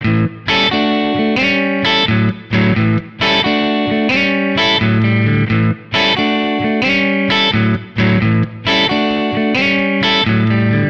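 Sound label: Guitar Blues A major 88bpm; Guitar and Bass; Ableton; Factory Packs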